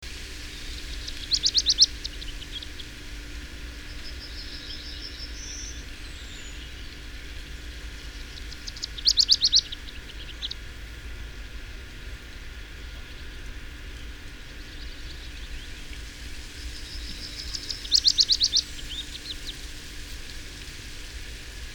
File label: Poikkeava Phylloscopus, todennäköisesti pajulintu P. trochilus / An aberrant Phylloscopus warbler, probably Willow Warbler; Lohja; Laulunäyte 1